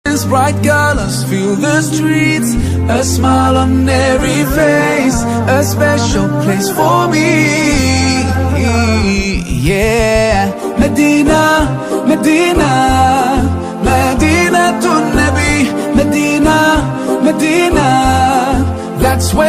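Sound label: english islamic ringtone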